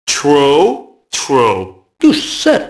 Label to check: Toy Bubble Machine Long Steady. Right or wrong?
Wrong